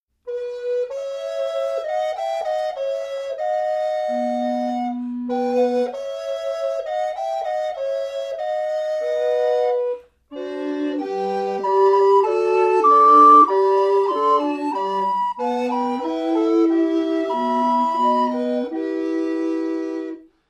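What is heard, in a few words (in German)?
Blockflötenquartett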